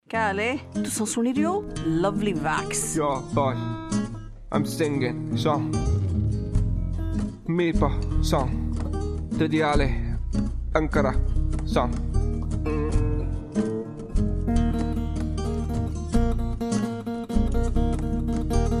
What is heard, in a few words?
Mirpuri guitar